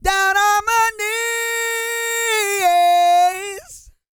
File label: GOSPEL